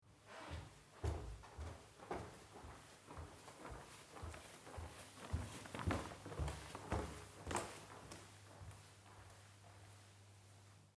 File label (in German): Flur gehen